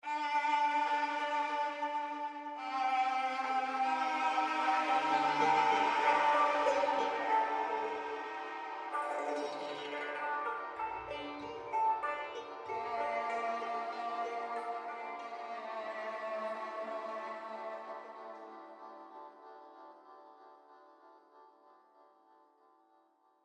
map start background; Valorant; cstrike

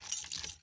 Equip bullet; Valorant; odin

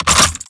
Reload Mag Out; Valorant; ares

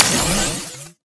Inspect Charge, karambit; cstrike; valorant; rgx karambit

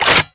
chg hnd fwd; Valorant; operator

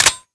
slide lock; Valorant; ghost

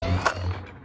draw; valorant; 2024champ

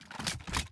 inspect, weapons; cstrike; valorant; singurality phantom

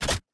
equip, weapons; cstrike; valorant; singurality phantom

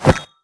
throw bomb; Valorant; raze

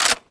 forestock back, weapons; Valorant; bucky

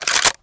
inspect lever in; Valorant; marshall

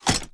Reload Top Open End, weapons; Valorant; odin

